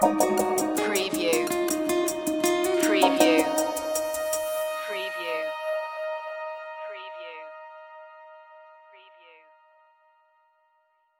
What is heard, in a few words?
take me over stinger, down tempo, Music sting